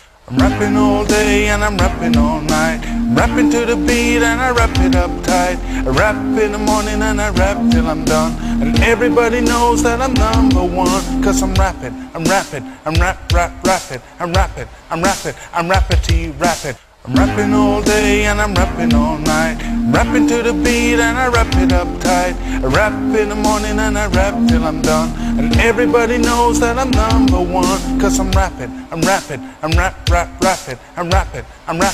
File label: ringtone